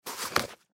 Tennis Shoes Up, Tennis Shoes Pick Up; Tennis Shoes Pick Up Fast Carpet; Foley

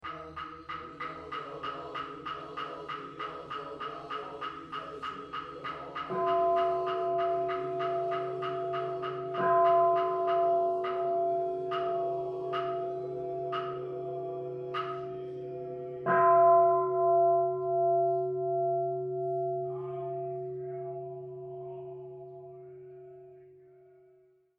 Temple Monk Chanting Tokyo; Temple sticks and Temple bells in a Buddhist temple in Tokyo, Japan; Zinjo Ji; Chanting Sound Effects

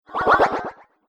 Future Vroom, whoosh sound effect

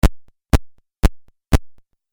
Footsteps Video Game

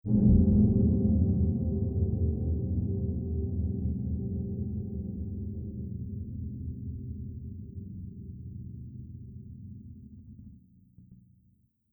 Sci-fi Horror Reverb Hit; Sf Horror - Reverb Hit; Sci Fi Sound Effects; Science Fiction Sound Effect

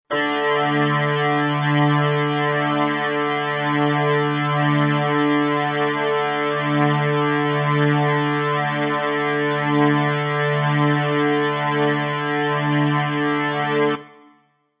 Bagpipe Low D 130

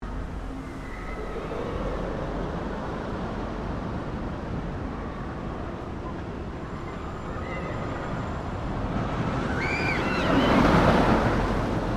ROLLER COASTER TOKYO DOME PASSES, Amusement Park; Roller Coaster in Tokyo

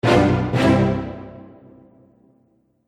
Bold Orchestral Accent; Musical

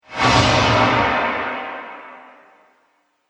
Whoosh; Media Fx; Whoosh Sound Effects

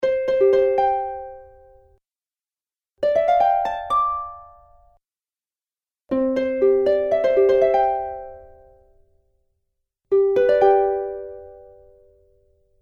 10 Sounds - Fantasy Harp; Cartoon Music Sound Effects

